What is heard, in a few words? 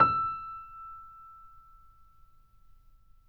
Mp E5, Grand Piano; AKAI S6000 CD-ROM - Volume 2; Grand Piano 256M